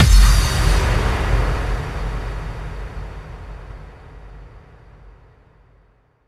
FX Kicks

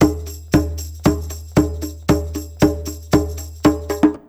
TRIB PERC, Roots Disco; USB Soundscan vol.32; AKAI

